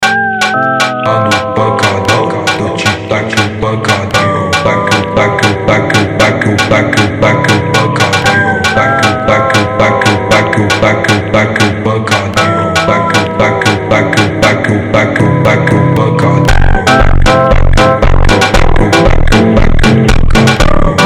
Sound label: Phonk Slowed